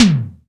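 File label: SP-12, drum machine; Best Service Gigapack 2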